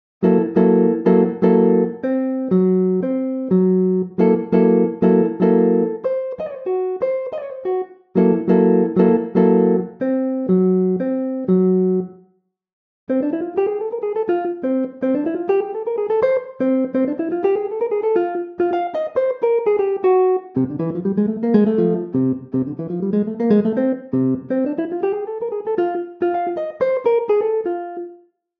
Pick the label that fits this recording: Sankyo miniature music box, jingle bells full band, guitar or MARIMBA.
guitar